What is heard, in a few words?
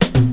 reggae break, DRUM LOOPS and BREAKS; FILLS and ROLLS